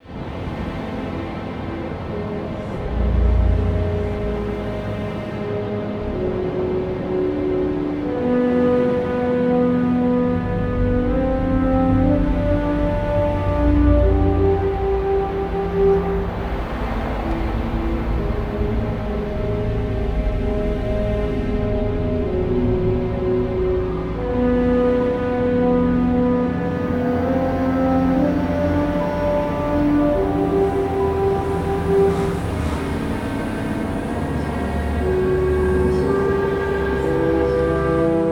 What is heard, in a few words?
Horror Mild; Sherlock Holmes The Awakened